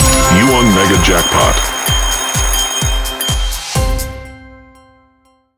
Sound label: mega jackpot; wbg games stable; roulette